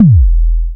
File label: BD ANALOG; Sound & Vision - Gigapack II CD 2